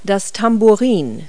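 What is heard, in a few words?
tambourine; Multi-Lingual Talking Picture Dictionary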